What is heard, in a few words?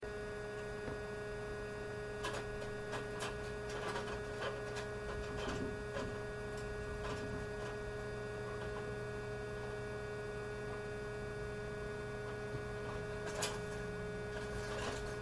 window